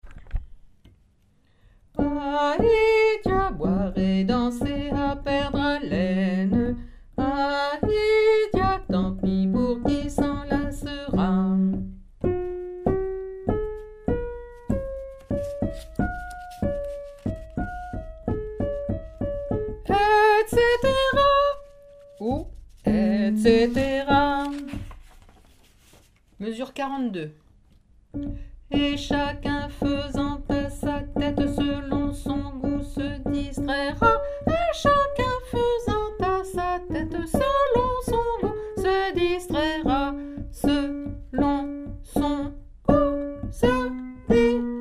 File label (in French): chanson villageoise basse, chorale